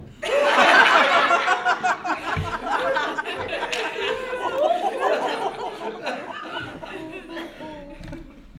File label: Laughing Audience Laughtrack